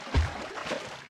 slosh, footsteps, walk

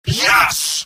scout cheers; mvm

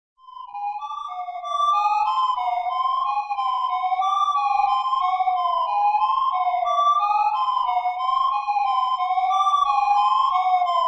sci fi eerie space; cartoon

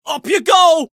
taunt flip exert; scout

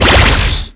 medi shield deploy; tf

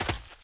grass, player footsteps survivor walk; source engine; left 4 dead 2

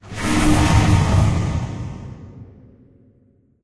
crusher open; Source Engine; Portal 2

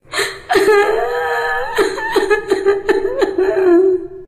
walking cry, witch voice; npc; source engine; left 4 dead 2

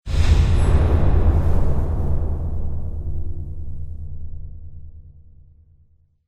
tone metal movement; source engine; tf; lair

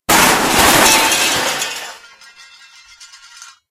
container crash; source engine; portal2

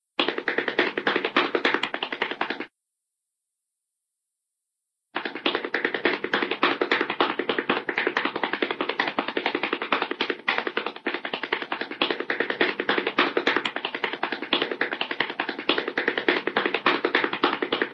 running feet; cartoon; hannah barbera cartoon sound fx

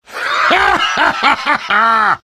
laugh happy; vo; heavy

